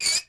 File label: meat Swing; impacts explos